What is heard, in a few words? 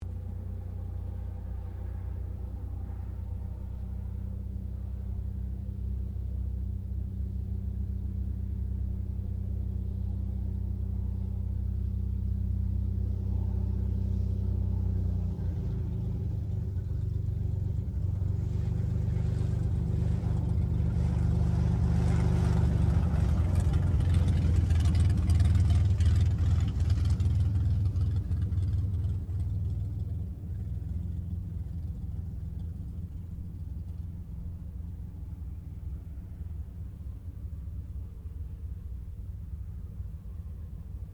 AIRCRAFT AT-6D HARVARD MK III TAXI DISTANT; Temora Harvard MK III; Airplanes; Vehicles